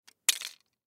Pill Package, Pill Package Empty Drop Cement; Drops; Foley; Blastwave

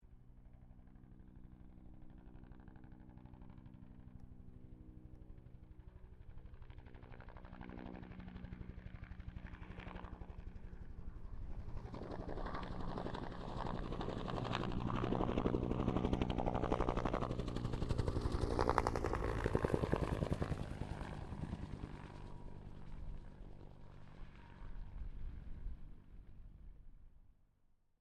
HELICOPTER BELL UH1 IROQUIOS PASS; RAN UH-1 Iroguios; Helicopters; Sound Effects:Vehicles

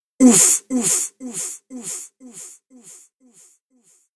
Human Fx Grunt; Grunt Sound Effects; Human Fx - Grunt 4

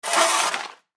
Industry Shovel Scoop Scrape Cement Single; Hand Tools

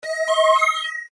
Flash Animation Multimedia; Web Interactive; Technology; Buttons; Blastwave

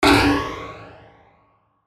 Hit Flange Up; Blastwave

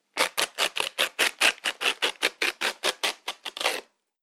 Ripping Paper Bag; Ripping Sound Effects; Foley Sound Effect

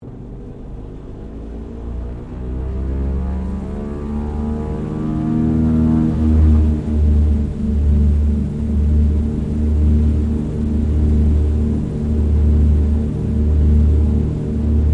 AIRCRAFT DOUGLAS DC3 TAKEOFF INTERIOR; Qantas 1942 DC3; Airplanes

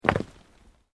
Earth Loose Move Short

